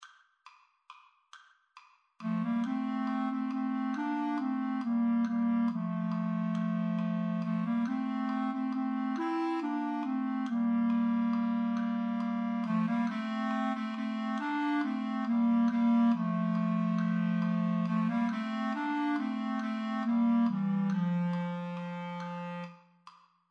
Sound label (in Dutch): Mexicaans volksliedje